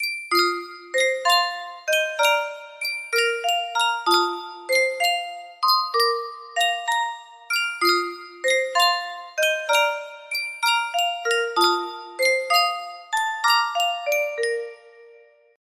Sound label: yunsheng music box, waltz